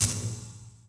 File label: Zap, electrical sparks